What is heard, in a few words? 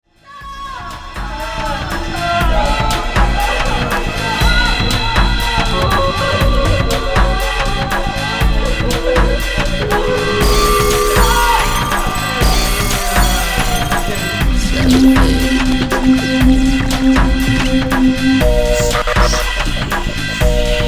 drum bass outro